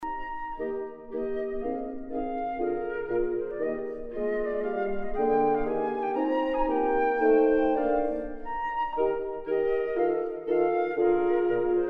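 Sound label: wind quartet; gambaro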